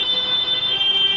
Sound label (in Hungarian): mercedes, szirena